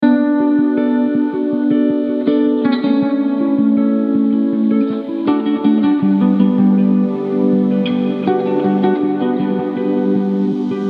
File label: Lofi